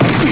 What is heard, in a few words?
fall